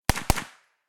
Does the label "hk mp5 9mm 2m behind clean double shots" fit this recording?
Yes